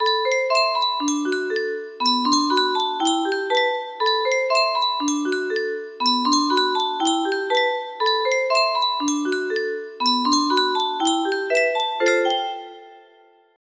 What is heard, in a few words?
Sankyo tune music box